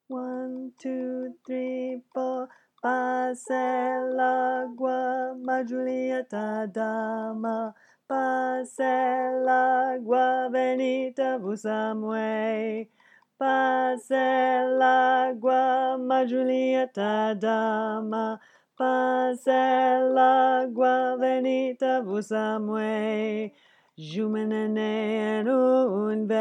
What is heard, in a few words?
low part